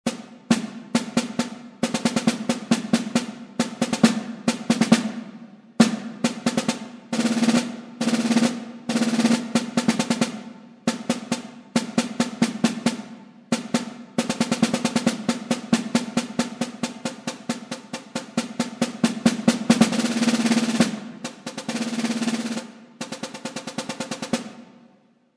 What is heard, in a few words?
snare set